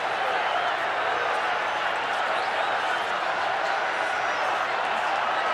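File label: BMX Crowd Ambience Idle Loop; Crowds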